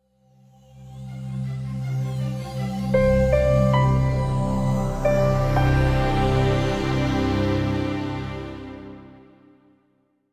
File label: Piano; SWR; Landscape